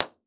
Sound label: Office; MIT environmental impulse responses